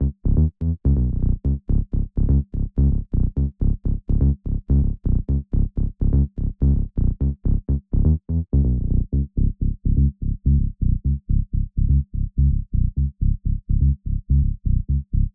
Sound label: 125BPM DRIVE BASS C#, Bass Loops